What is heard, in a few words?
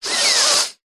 human male short sniff; zapsplat